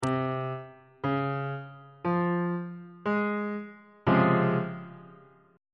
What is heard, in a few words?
d flat major 7th 3rd inversion on bass clef